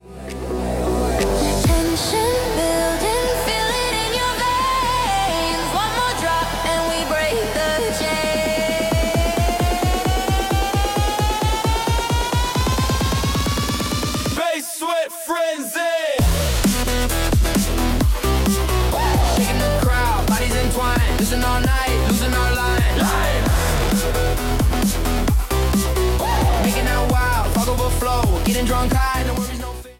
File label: bass